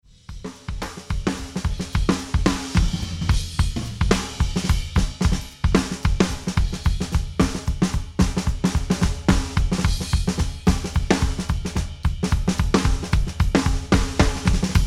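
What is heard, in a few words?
Mix Drums Kit Mic Kick snare drum Only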